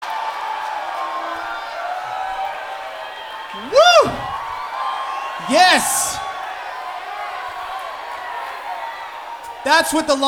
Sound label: Applause